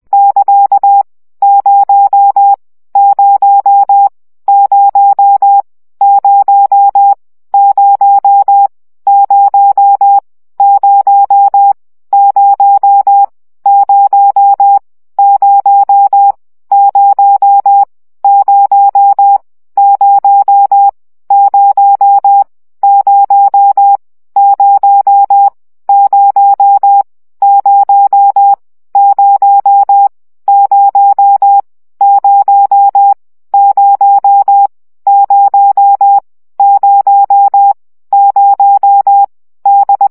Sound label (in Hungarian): CW gyakorlas 1 17 baud